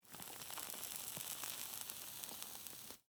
Cigarette Inhale, Foley, Cigarette, Inhale, Sizzle; Smoking (Sound Effects:Human)